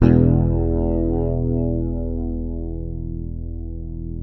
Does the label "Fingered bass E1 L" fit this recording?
Yes